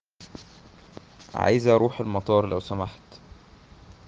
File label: airport